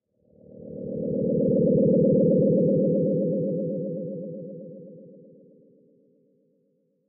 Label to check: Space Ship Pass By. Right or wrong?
Right